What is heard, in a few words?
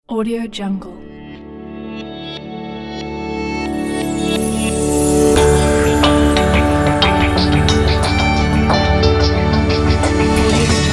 atmospheric transparent logo